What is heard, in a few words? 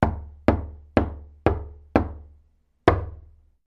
Door Knocks Wood